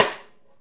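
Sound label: snare A3, multisampled real kit; REAL LIVE KITS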